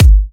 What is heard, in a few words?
Kicks; Vengeance Samples